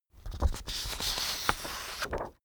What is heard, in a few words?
sheet take